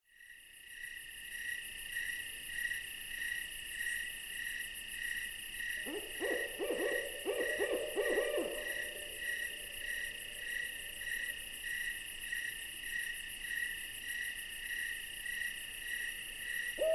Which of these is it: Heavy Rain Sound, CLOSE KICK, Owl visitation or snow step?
Owl visitation